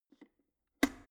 plastic water boiler open lid; maschinen; wasserkocher

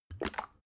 bottle syrup upend soft; berufe; gastronomie

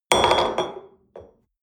espresso upset table hard, restaurant cafe

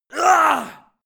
male fight intense; schreie; menschen; soundpool

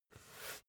basketball turn swipe long; sport freizeit

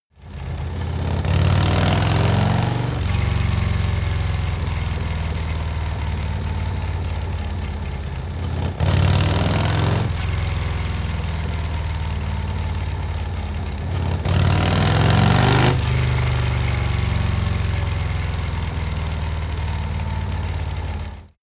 motorcycle harley accelerate triple hard; motorraeder; verkehr transport

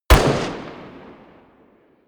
revolver distant shot; schusswaffen

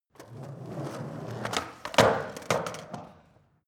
skateboard indoors drive kick away

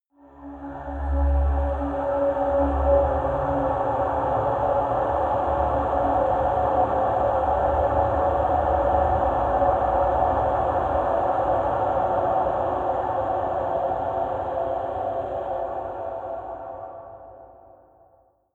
scrapes long scary, horror; atmosphaeren umwelt

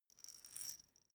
small glass pepper grinder turn slow

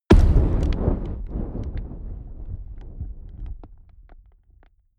fire impact long; explosionen; comedy-cartoon; soundpool

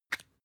floss open compartment soft; haus-garten; badezimmer

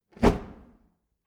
fire torch impact; swooshes-swishes

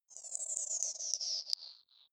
sci-fi power off; swooshes-swishes

